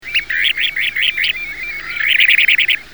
Charadrius alexandrinus

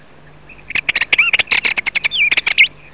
Sylvia rueppelli

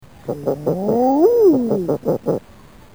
Somateria mollissima

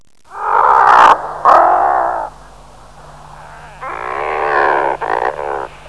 Uria lomvia

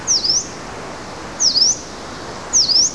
Phylloscopus inornatus

